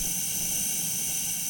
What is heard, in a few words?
pressure loop